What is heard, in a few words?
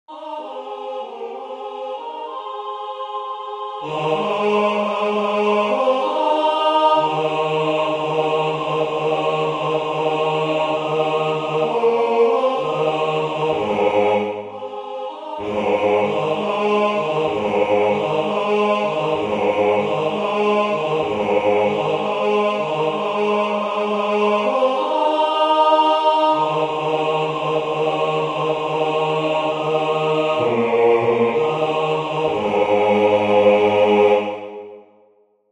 bass; From Starry Skies Thou Comest